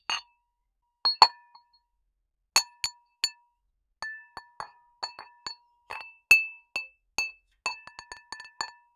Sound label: the clinking of glasses